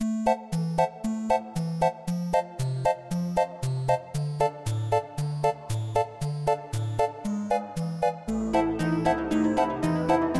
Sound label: Main Theme INTRO; Death by AI